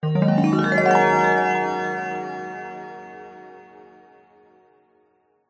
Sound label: magic glissando ascending bright warm twinkle; fantasy; zapsplat